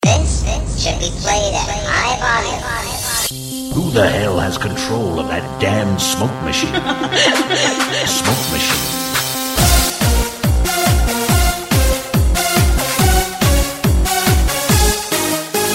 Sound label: disco mix ringtone